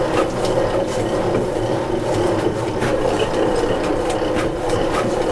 Vehicle Weapons; Red Orchestra Ostfront 41-45

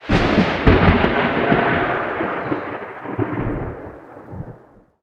ambient battle; World Of Warships

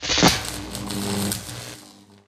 ambient port; World Of Warships; port3d

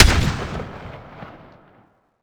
imperial carbine 1shot

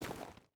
footstep boots stones walk; Rust

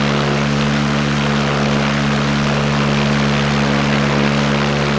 Rotax Engine; DCS

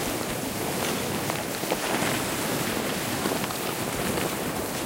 landing snow cockpit

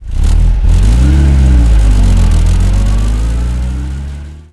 centurion engine load, tanks; War Thunder

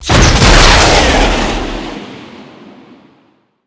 f15 missile fire; gulag; Call of Duty Modern Warfare

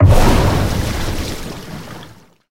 torpedo impact

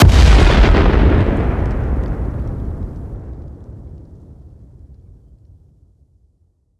combat atmosphere; World of Tanks

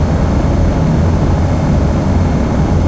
t90a engine int rpm 66 load, tanks; engines; War Thunder

